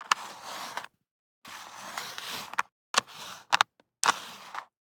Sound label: DICE on Cardboard, Slide, Standard, Three Dice; Samples; ArticulatedSounds; DICE Sfx Party (Extended)